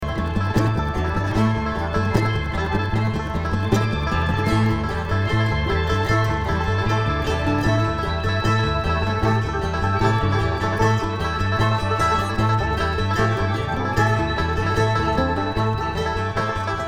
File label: Traffic Jam